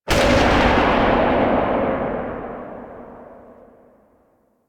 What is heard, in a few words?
Sniper Tail, Weapons; DE; Warframe; Tenno; Quad Sniper